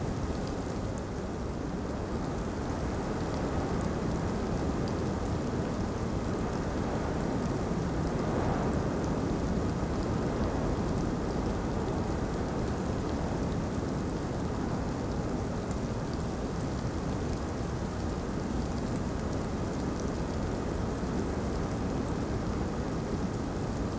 ambience desert day windy drizzle; Rust

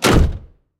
cockpit door close

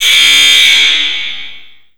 jaildoor buzzer; Modern Warfare

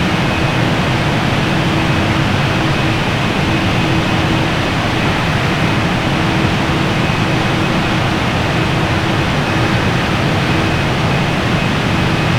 Weapons Cannon Wind; DCS

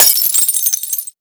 glass

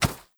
horse footstep sand hard; Rust

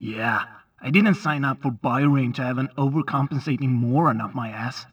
city grunt

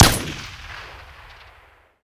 mg 1shot stereo

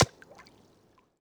water small

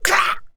hurt light

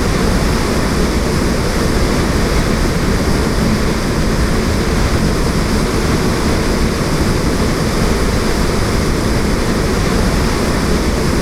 Aircrafts P-51D Plane Wind In R; DCS

